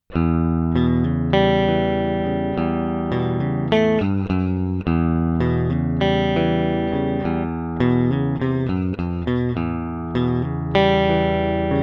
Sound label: lehle buffer off